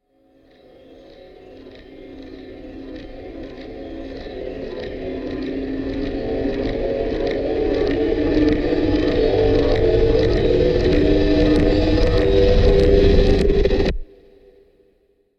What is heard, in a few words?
paranoia riser; fvtt sonniss gdc audio